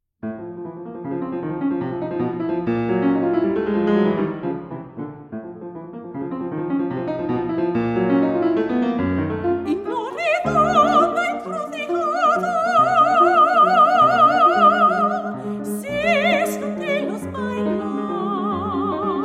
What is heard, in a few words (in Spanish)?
guitarra; adivinanza de la guitarra